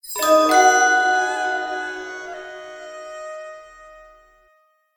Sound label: stinger loot box lunar rare; heroes of the storm full gamerip 2015